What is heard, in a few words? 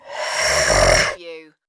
Monster Animal Sneer